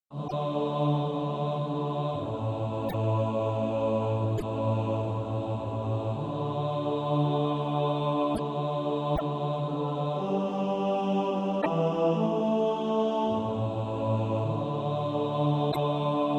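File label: bass, Practise Music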